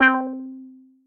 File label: Brass Keys, Retro Synths; Ableton; Factory Packs; Vector; Vector Patches